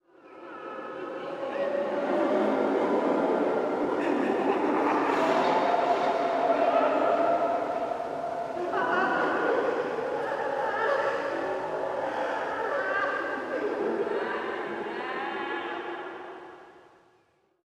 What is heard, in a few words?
hell wails random